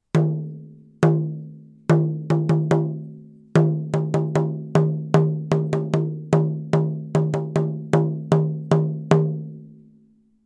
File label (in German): kindertrommeln gross; Eine kleine Trommel aus Holz, bunt bemalt und mit Naturfell bespannt